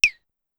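Claves Down More, Drum Machines Clavia DDrum-AT Pitch Envelope; Pitch Env Various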